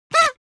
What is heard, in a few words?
cartoon horn paper hoot loud short